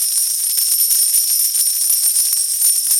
coins; silverback

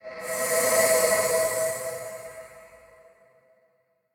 free Spin Multiplier; mythic maiden

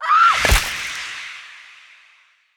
big Win Stabb; bloodsuckers

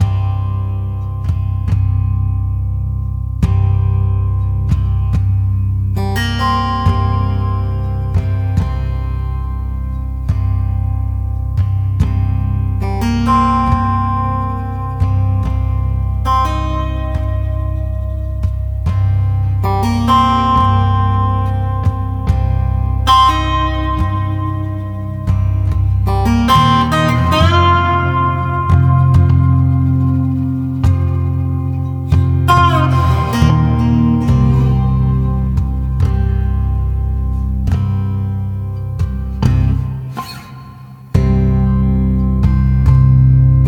intensity; dead or alive 2

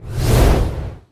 win sweep Horizontal; wild worlds

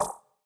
alert No Win, roulette

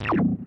paytable close; spacewars

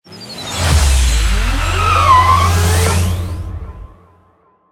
Big Win Intro; knight rider

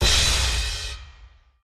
big Win Txt Change; piggy riches

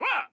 Cheer; eldorado

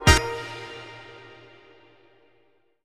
smallWin; jewel fruits

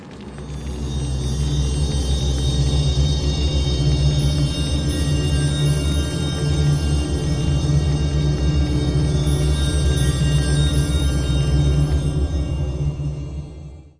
near Win; secret of the stones

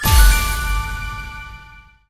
medium Win; parthenon

